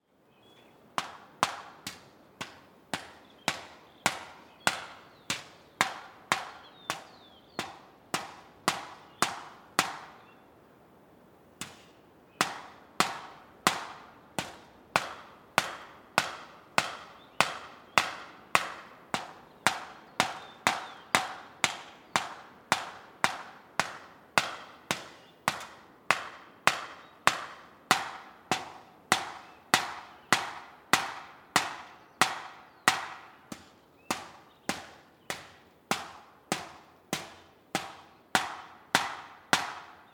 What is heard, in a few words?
industrial hammering exterior constant beat; felix blume